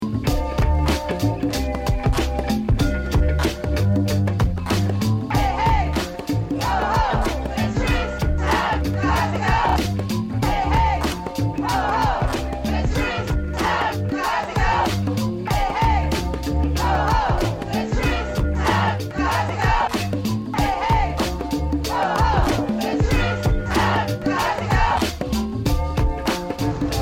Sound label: dissent unison; cindy sheehan